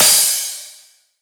soft hit finish

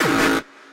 gabba

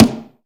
lower clap

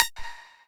lead

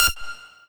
lead

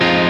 Em7

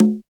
normal hit normal; osu

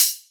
soft hit normal

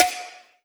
soft hit clap; osu

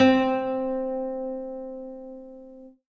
SCG C5

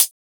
hihat

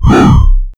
Growl

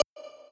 lead d

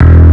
bass; osu

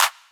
clap

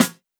snare

